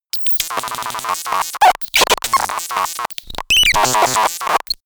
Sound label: glitch communication